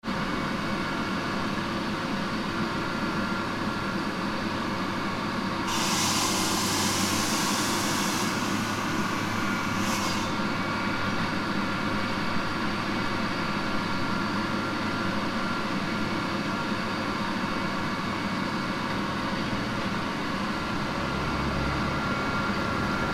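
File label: decontamination parking, Fukushima Soundscape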